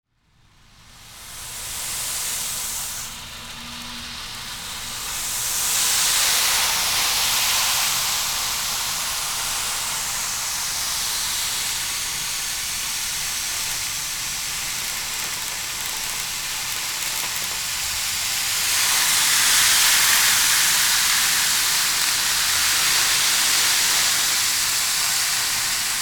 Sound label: ocean drum